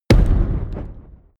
fire impact short; explosionen; comedy-cartoon; soundpool

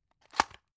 drug open hard; arzt

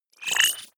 wobble rise short

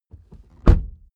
suv close door hard

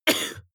cough male single short; soundpool

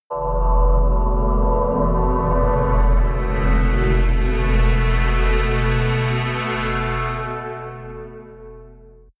large hollow drone

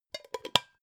pot lid close; objekte; topf

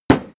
impact dull metal damped; soundpool

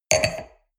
wine glass place table medium; berufe; soundpool

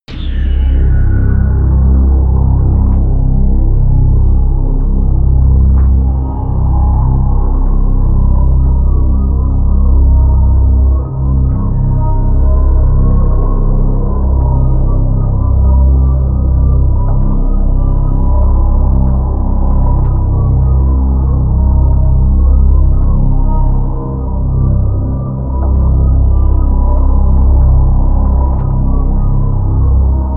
drone apocalyptic drone

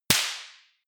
party popper; hits-explosionen

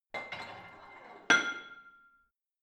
barbell plate put on hit ring, fitnessstudio; sport freizeit

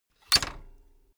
bicycle kick stand swing out

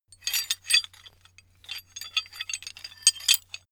metal handling pieces sequence; atmosphaeren umwelt; baustelle

